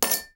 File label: coin